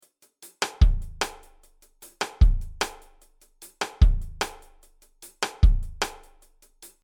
Reggae 75

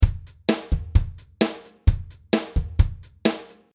Country Pop 130

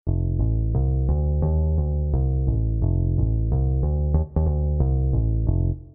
squid bass comp

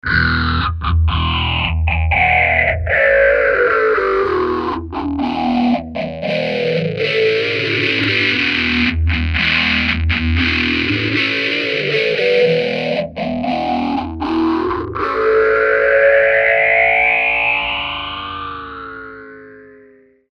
chunky phase

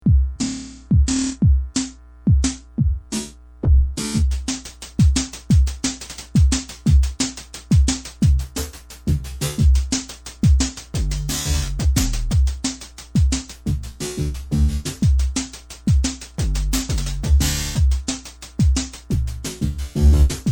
Tube

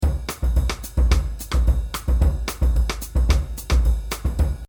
Jazz Samba 110

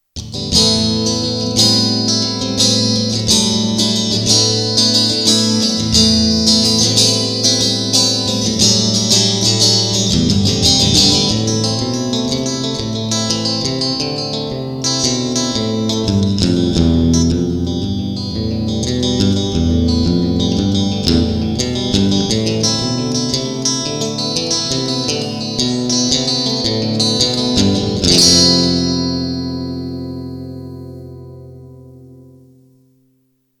ACOUSTC

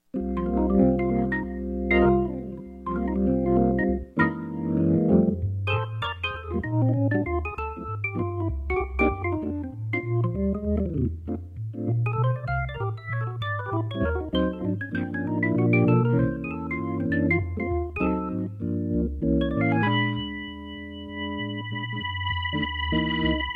Groovin

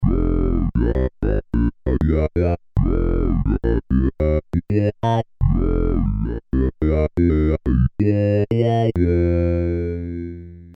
vowel bass

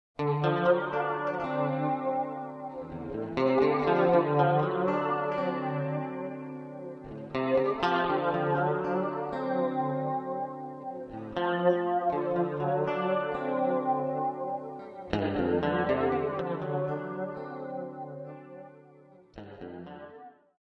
H Grail; J Friedman

